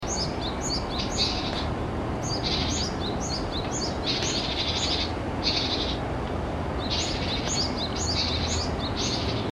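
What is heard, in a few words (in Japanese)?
sijyuu, 野鳥の声; シジュウカラ; 音声はヒヨドリとの協演です。; 四十雀が鳴き出すともうすぐ雨かなあ。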